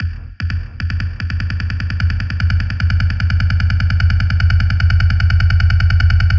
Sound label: Stutter Drums 150 BPM